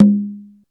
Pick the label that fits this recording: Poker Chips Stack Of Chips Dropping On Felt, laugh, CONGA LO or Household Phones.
CONGA LO